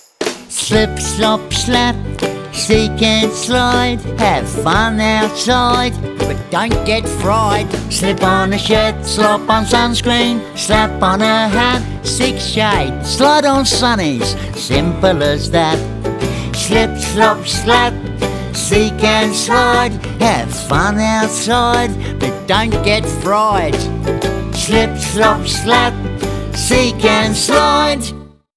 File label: Slip Slop message song